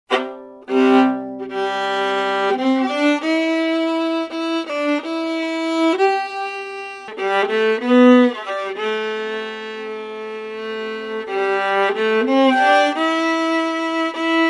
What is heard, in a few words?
fiddle